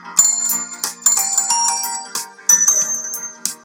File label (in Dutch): Muziekfragment Nederlandstalig